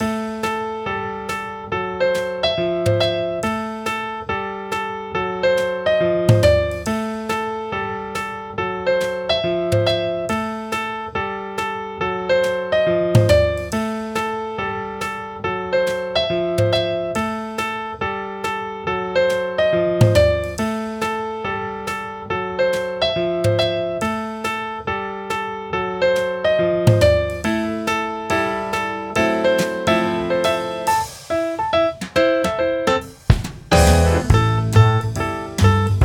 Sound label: Dope Jazz; 음식과 잘어울리는